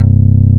NYLON C1, BASSES

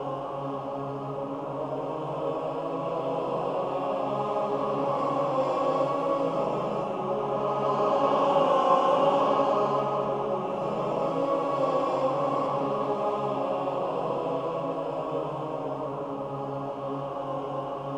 ROUND SPIN, Voices

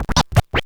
SCRATCHES; Scratch 1

